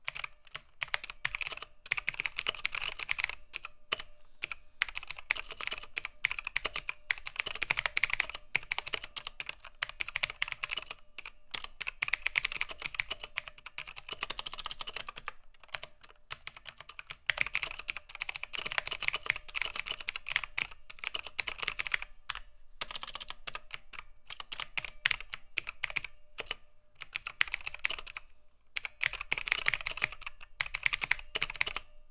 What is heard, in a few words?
Aukey KM G12